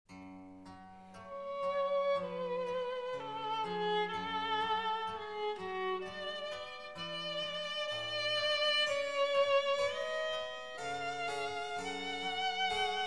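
sonata for violin and harpsichord, andante un poco; BWV 1015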